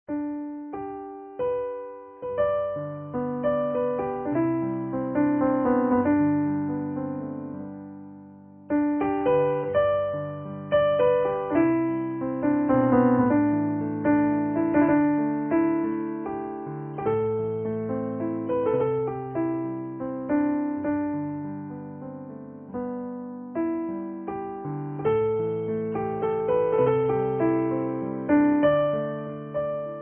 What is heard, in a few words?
music Piano Waltz